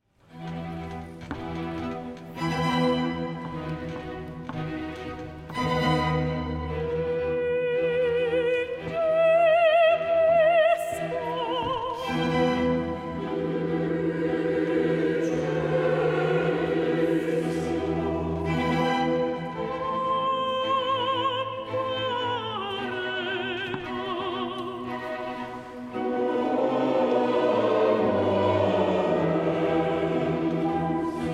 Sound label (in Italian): Messa da Requiem per soli, coro e orchestra- Ingemisco, Adagio